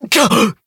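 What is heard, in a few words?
damaged, Japanese; joker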